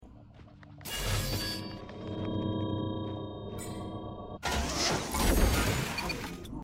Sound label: turret; risk of rain 2